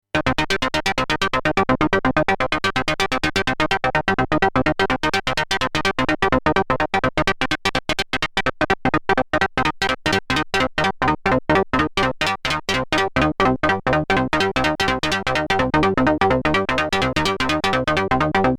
Arpeggiator Shuffle Length Modes; Dominion 1

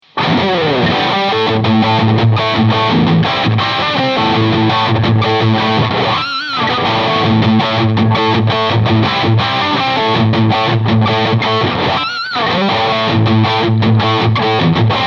Black Spirit 200 Combo Lead

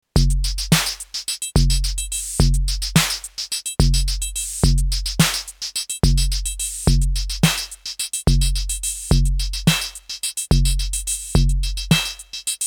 Noise to Metal; Blck Noir

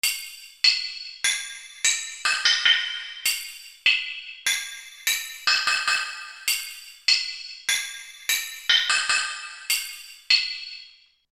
Kawai XD 5 Ringmod Single

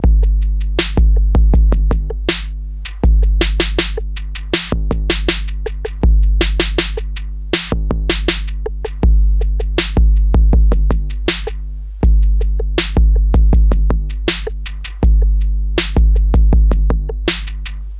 Oversampled TR808 Scratchoid Stark NY PR AIR; Pancz

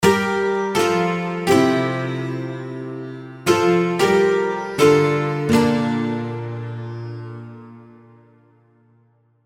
All Strings; Nexus 3 KSHMR Expansion

